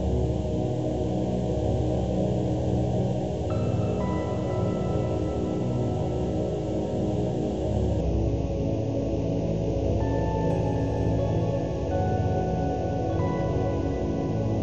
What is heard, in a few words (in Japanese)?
夜のイメージBGM; ゆめ2っき